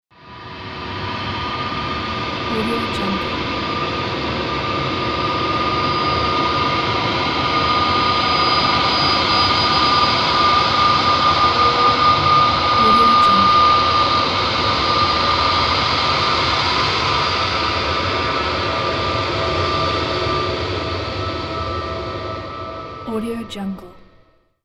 airplane engine

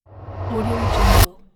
suck in transition

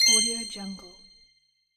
coin bling

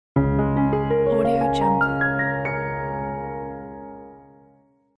up piano logo; soundrose

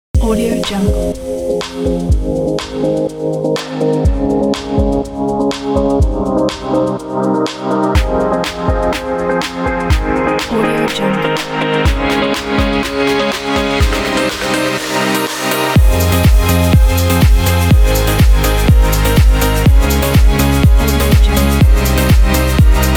house music

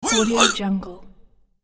judo grunts

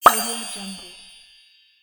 magic bubble pop

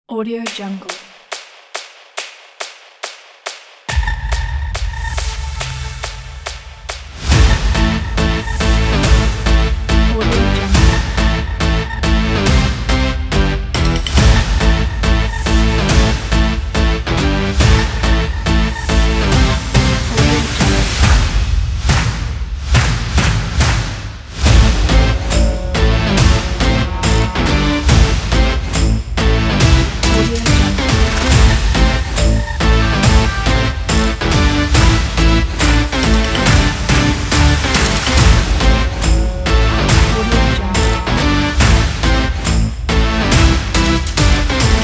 cartoon trailer groove; wildkittytunes

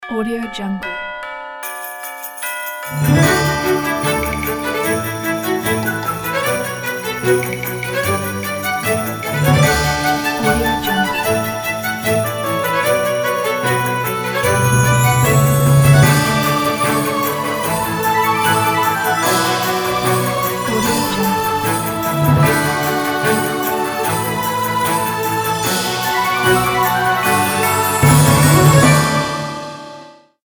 fabulous christmas logo

